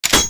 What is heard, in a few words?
cannon draw